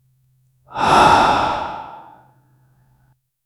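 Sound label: hff focused inhale during, jump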